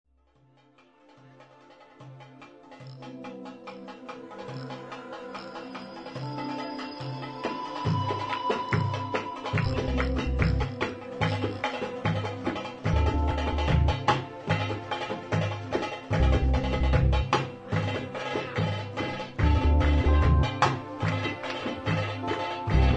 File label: tribal